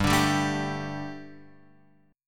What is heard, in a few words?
G Suspended 2nd G, down, Guitar, Open F; Gsus2 strummed; JGuitar